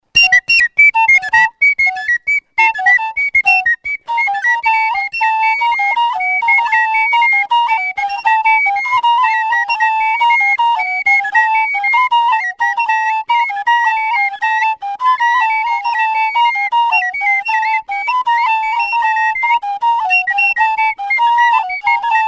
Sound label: yerbik trio, ghana, bimoba